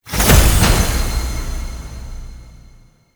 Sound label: Boon Fragment Pickup; Pickups; Duviri